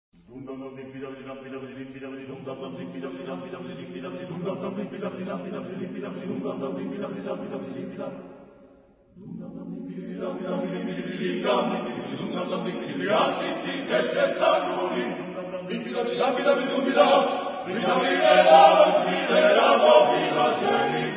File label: drums